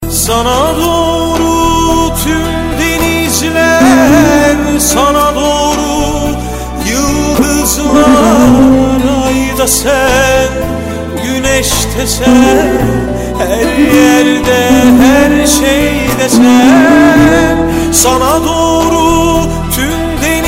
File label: Turkish Tone